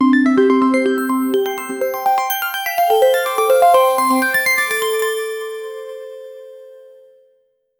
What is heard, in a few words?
short educational app sta